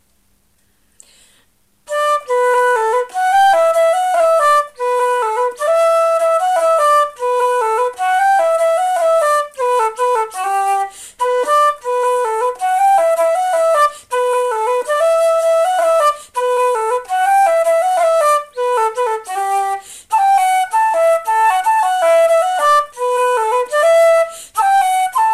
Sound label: slide slow; Paudie Scully's